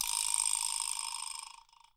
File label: VIB LNG, Percussion; Sampleheads; NYC Percussionworks; ST PERC VOL2